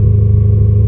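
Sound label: engine exhale, advanced engine sounds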